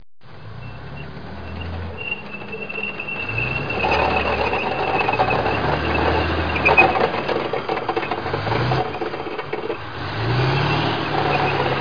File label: tank plt; Sound Effects Library 1.5